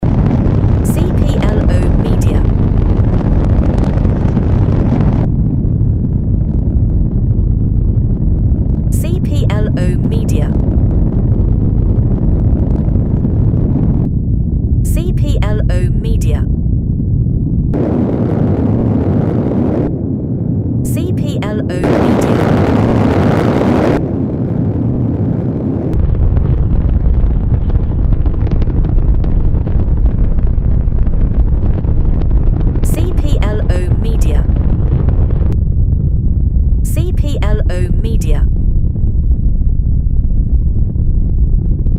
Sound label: Engine Sounds; machine sound fx